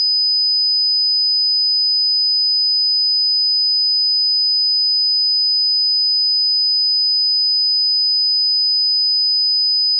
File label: Presence 5000hz